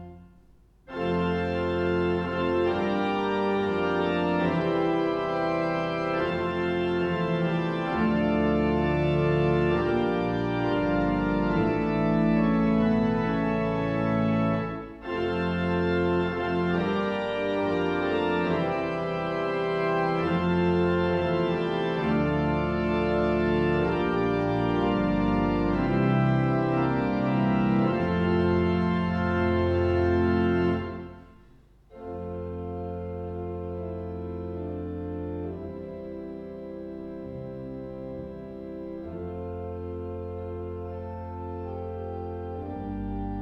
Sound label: Fanfare; Orgelmakerij Steendam Stedje Kyrkje; ZOOM H4n